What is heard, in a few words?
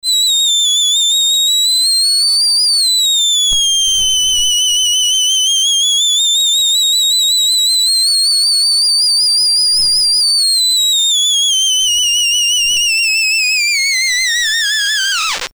theremin